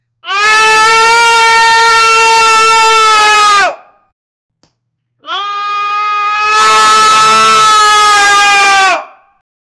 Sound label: a man screaming in pain; misery